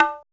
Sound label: ethnik slap; ETH. PERC; Drums In Your FACE!